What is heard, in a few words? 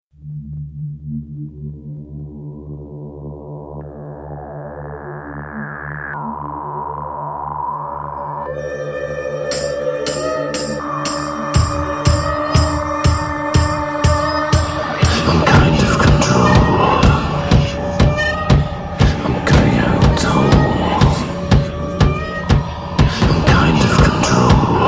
Dulcimer